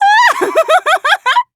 laugh

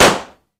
pop

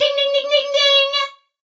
ding ding ding ding ding

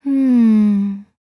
"hmmm"; celestia

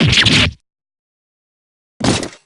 fall; drebber

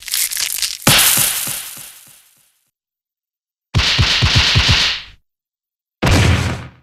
breakdown; nahyuta

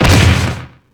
fall

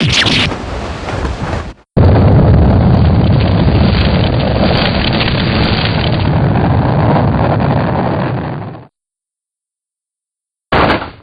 breakdown; alba

